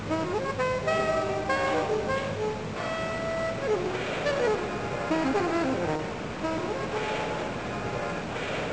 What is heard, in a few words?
song; lobby